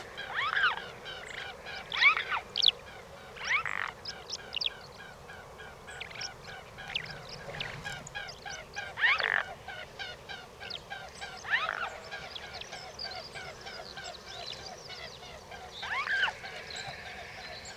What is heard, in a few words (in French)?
Cygne tubercule Cygnus olor